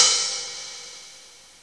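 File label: Cymbal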